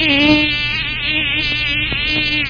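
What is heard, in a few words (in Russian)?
комар шумный монотонный скрипучий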